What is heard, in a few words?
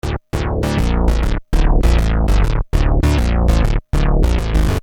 Syn Bass 100 D, Techno Synth Loops; Sonic Foundry (Sony Creative Software); Signals I